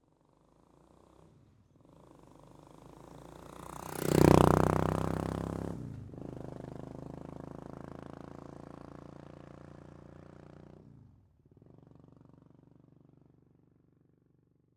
Suzuki VS 800 GL Intruder, pass-by back to front, asphalt; Cactuzz Sound